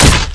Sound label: wood, debris